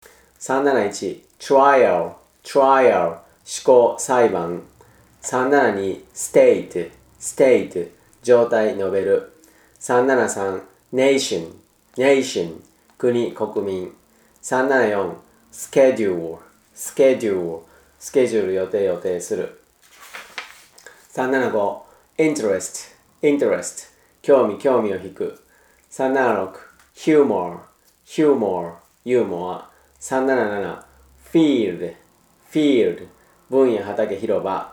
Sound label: tango